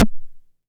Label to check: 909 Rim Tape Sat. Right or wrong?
Right